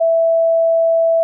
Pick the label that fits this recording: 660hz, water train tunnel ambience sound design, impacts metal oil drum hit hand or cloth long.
660hz